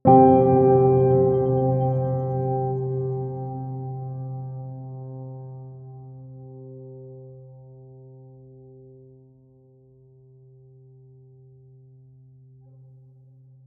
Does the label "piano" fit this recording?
Yes